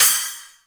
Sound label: CRS SPLS, Korg EMX-1, Drum Machines